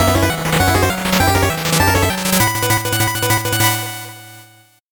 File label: 8bit jingle fast